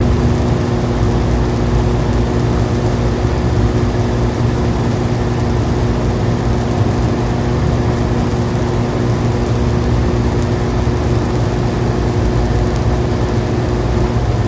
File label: Power Plant Transfo, buzz & hums